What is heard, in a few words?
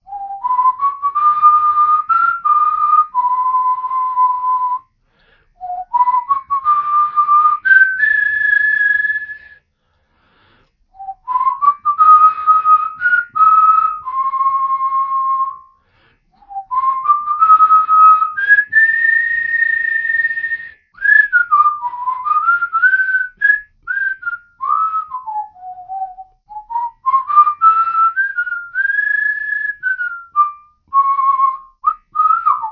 Robot Whistle Medley